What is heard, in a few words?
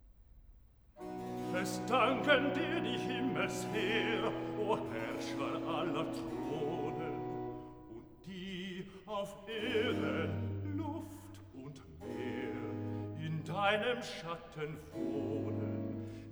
Cantata, Recitativo (Bass); BWV 117 'Sei Lob und Ehr dem höchsten Gott'; Bach; Warwick; 4th Sun After Easter